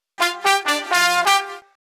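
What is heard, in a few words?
brass, Disco; Best Service ProSamples vol.48 - Disco Fever; AKAI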